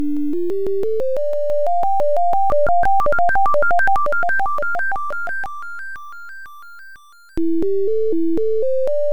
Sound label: ringtone